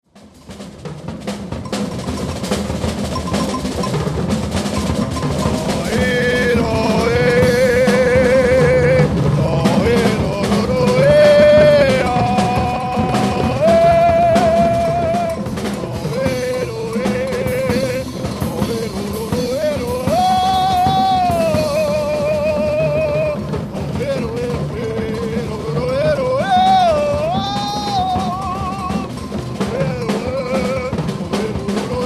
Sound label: vocal excerpt